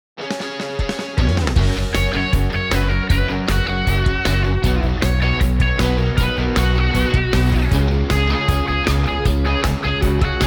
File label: Music Guitar Rock